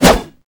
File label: swing longblade; arisha